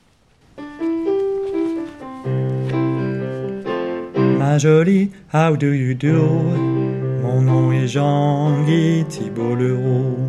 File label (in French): basse boomwhackers